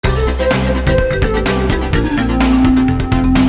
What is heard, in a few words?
pop; 軽快なポップサウンドの一部